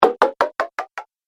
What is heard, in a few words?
Game Fx Ascending Hits, Video Game Sound Effects